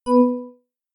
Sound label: bell window system; stereo; kde; ocean